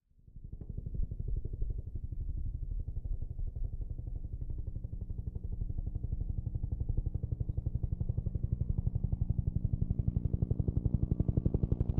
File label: Slow Helicopter By, huey